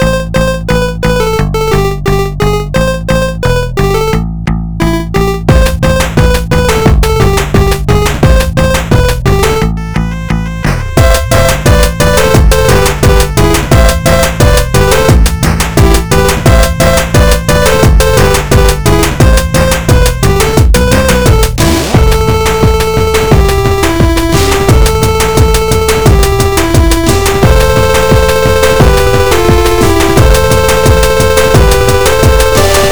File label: song